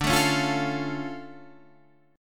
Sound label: D Minor Major 7th, D, Guitar, Half Step Down